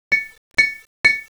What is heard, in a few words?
molot; cstrike